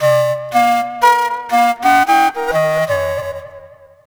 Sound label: Flute Effect, effected flute; Sonic Foundry (Sony Creative Software); Jazz Solos and Sections